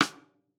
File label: Drum Tom Tom